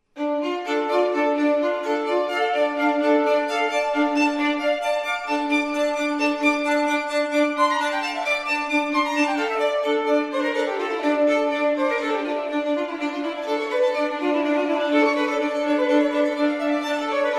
Concerto for 2 violins cello strings & bc; in D minor; Concerto No 11; Antonio Vivaldi (PD)

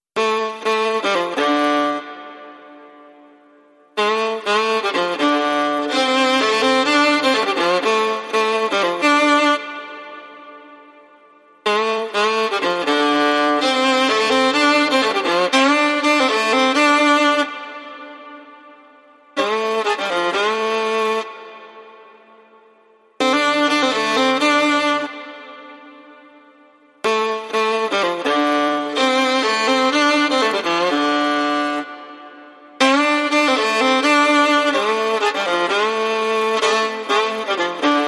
Cello

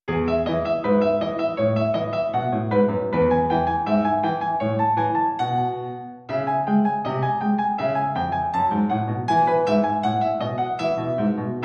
On The Bright Side, Piano

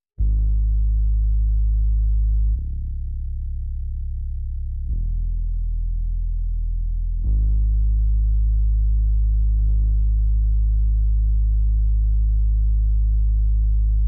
Drums and Bass Mix

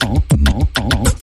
Rhythmic Percussion Variation Short Version

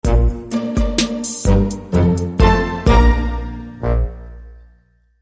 Electronic Drums

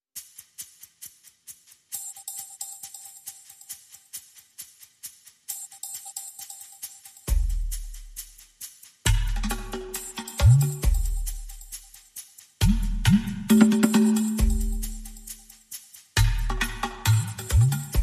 Perc Only

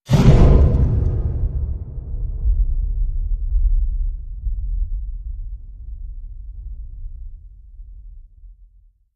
Hit Low Thundercloud

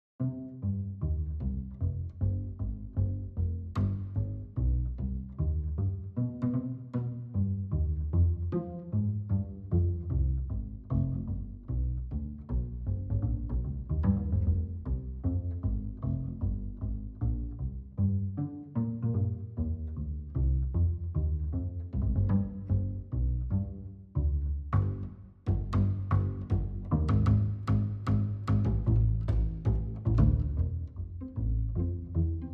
Bass

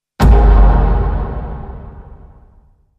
Low Hit, Sting

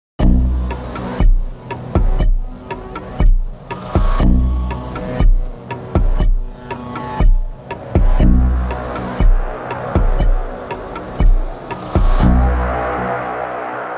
Countdown, Bumper

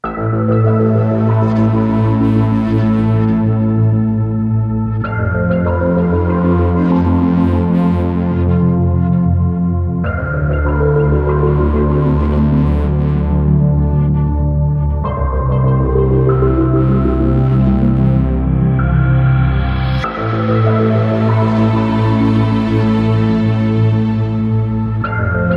(No Drums)

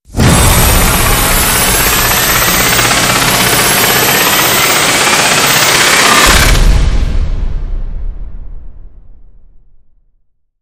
Rise Short Primary Thruster

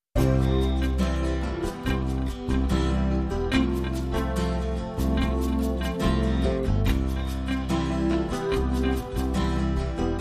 Counterpoint

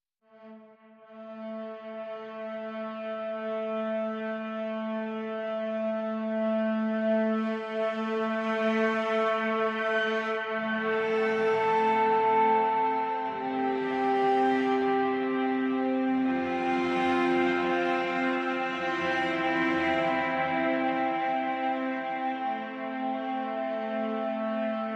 Cello Only Mix

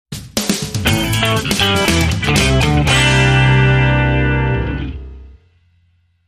Funky Guitar Stompin

